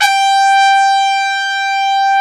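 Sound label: HORN SECTION; Greytsounds; Scott Peers Sound Engineering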